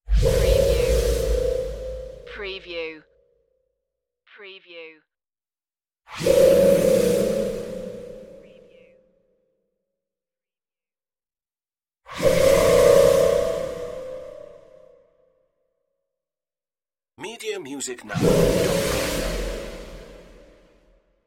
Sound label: danger appearance swooshes